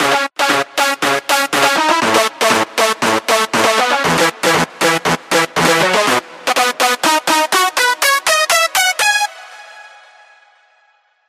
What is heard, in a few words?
BIGROOM BOUNCE